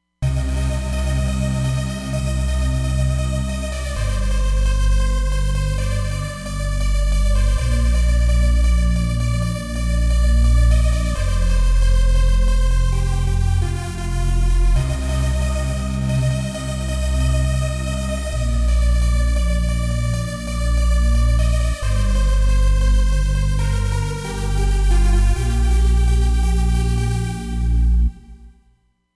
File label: Trance Split